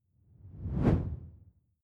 whoosh simple low; swooshes-swishes

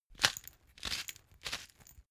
glass pepper grind sequence; berufe; gastronomie; soundpool

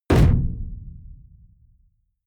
sci-fi shot tonal; schusswaffen

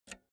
pull out jack multi socket quick; objekte; elektronik

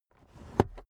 car headrest full adjustment

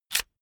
electric drill template slide out fast; maschinen; bohrer; soundpool

